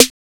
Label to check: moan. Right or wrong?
Wrong